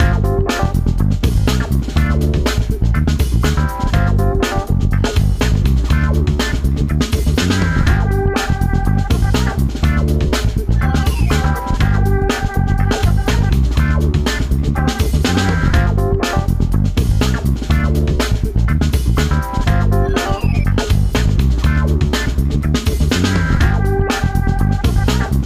Menu attraction

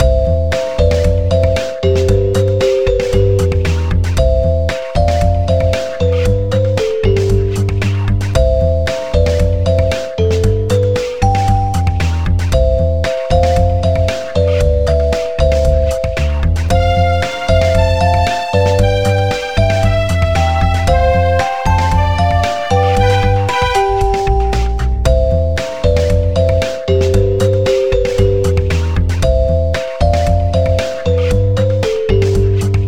Pile Searching Music; NDS

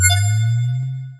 Panel Reassemble Attach; Among Us

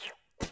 Spit; talking tom cat 2 android ios online gamerip 2011

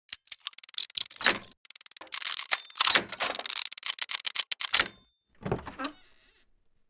Opens; Niou

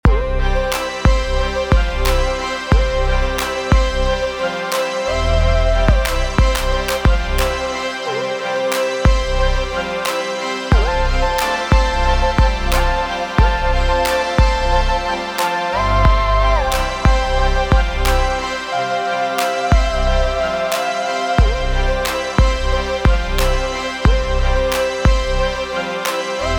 loop drums

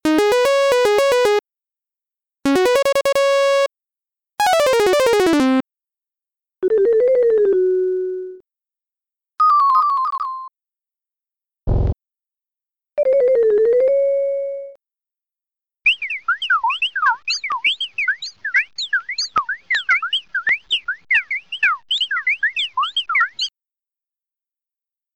Chimes & SFX; Marble Madness; GBA

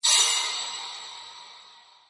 Click; the great ottomans strategy battle for throne android

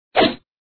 swing; pencak silat 2 2002 gamerip online

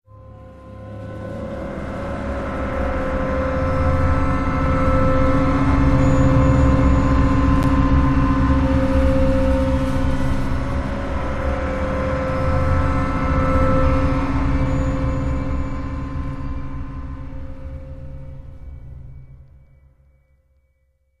Loop Tense; the walking dead season one gamerip 2012

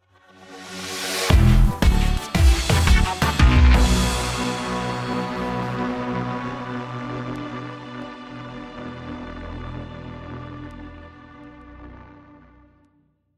won round

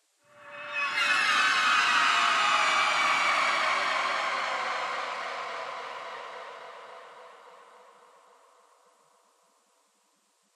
Combat String Start

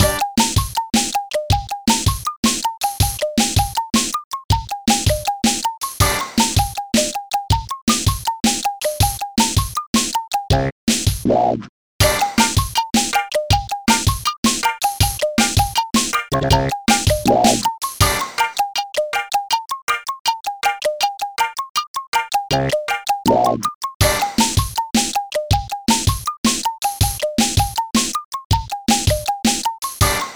BGM Quiz A Loop; Irodzuki Tingle no Koi no Balloon Trip; NDS

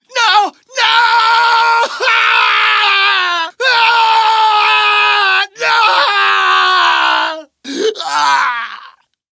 scream male; Lucius II The Prophecy

